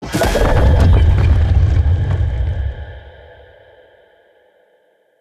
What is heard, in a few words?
Vassal Castling Impact; Enemy; Characters; Abilities; Mendicant; Mnd King